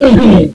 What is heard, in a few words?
zombie hurt